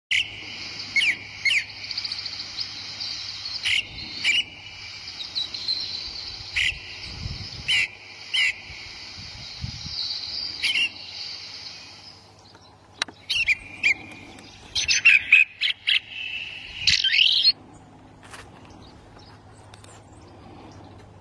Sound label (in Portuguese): Canto do melro de peito branco